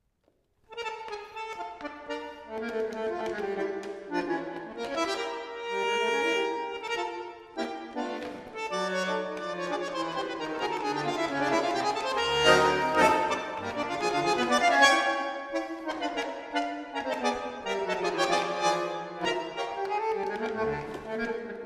tango; brel daniel

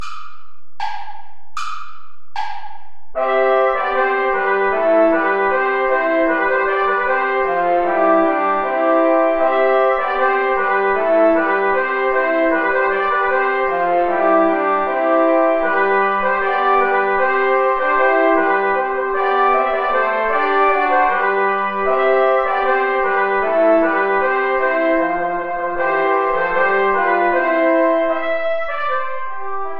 play along without clarinet; schma ckt den saal mit gra nen zweigen

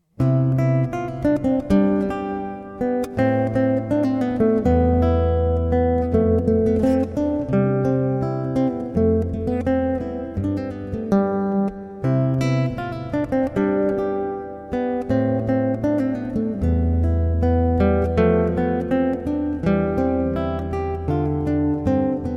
England folk song

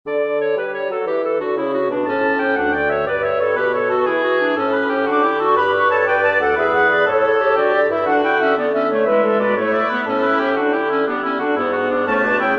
gigue for woodwind quartet; pachelbel johann; from the canon and gigue major

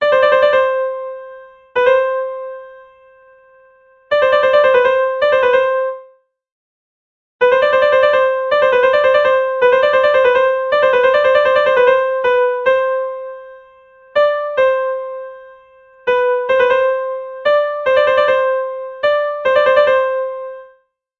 baroque trill; magatagan mike

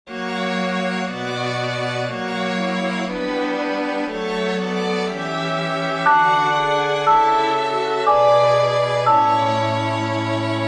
chorale for handchimes strings; bach johann sebastian; danke gott und lobe dich

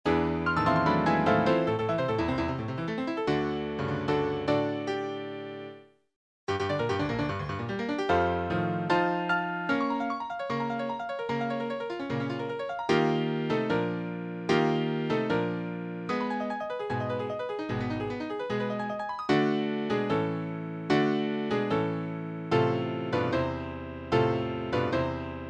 pieces for piano

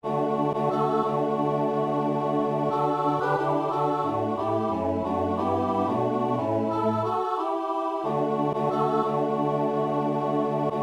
hail maria

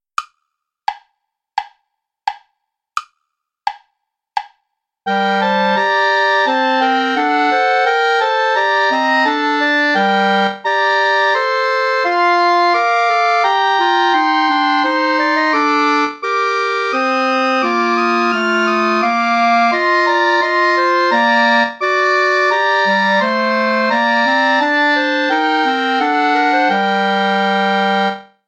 play along without clarinet

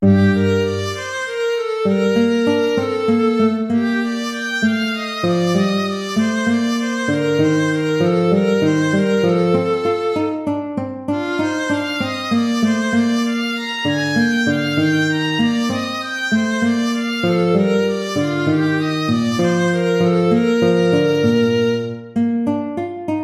gigue

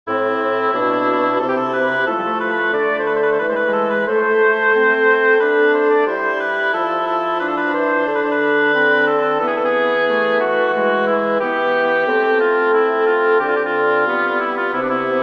for double reed trio; bach johann sebastian; prelude wer nur den lieben gott walten

